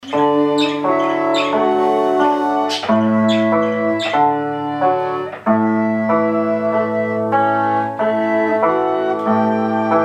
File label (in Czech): cimbal fletny